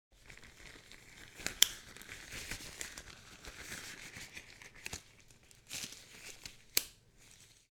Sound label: Rubber gloves latex surgical gloves being stretched